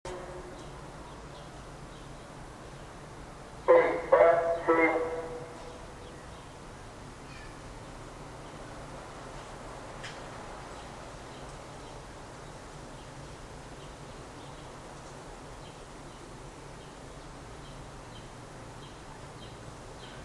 mosque speaker repair

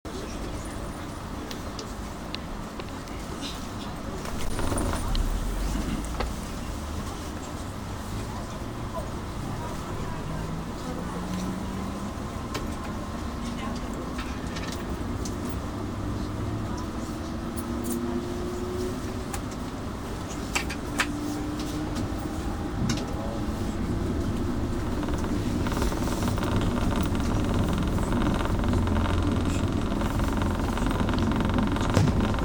ankara train soundscape

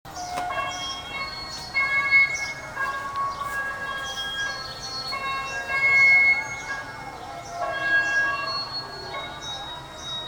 mosque error

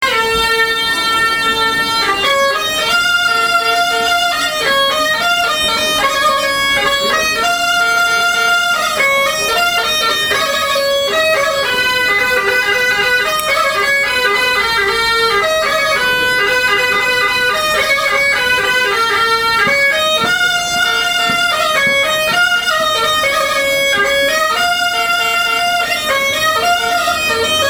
black sea musicians soundscape

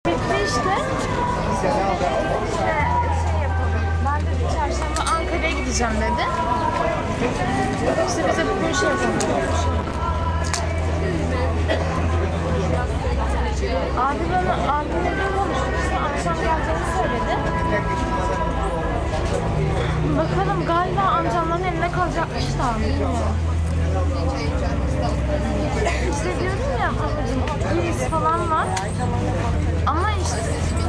besiktas spring soundscape

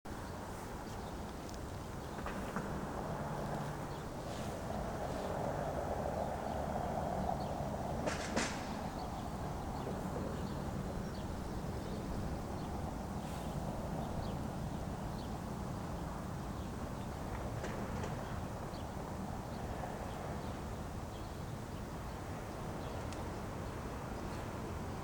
quarantine soundscape

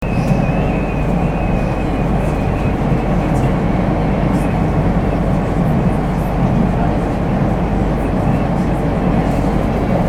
marmaray crossing soundscape

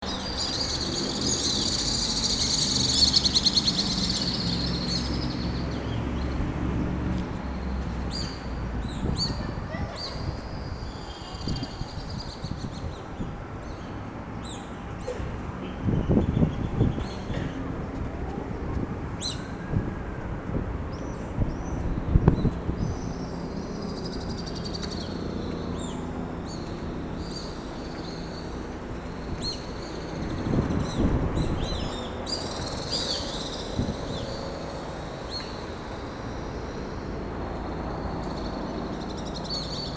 swift calls soundscape

